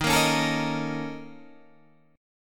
A sharp Minor Major 7th Double Flat 5th D sharp

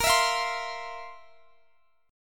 F Major 7th Suspended 4th Bb

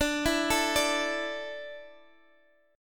D Suspended 2nd D down Guitar Drop D, chord

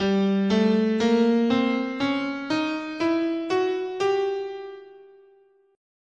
G Diminished Whole Half, scale

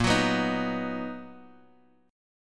B Major 7th Bb

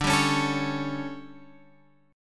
D Major 7th Suspended 4th, Guitar, Open G

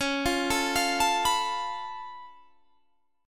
Db Augmented 7th Db down Guitar Standard; arpeggiated; JGuitar

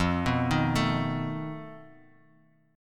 F 7th Flat 5th F down Guitar Open F; F7b5 arpeggiated

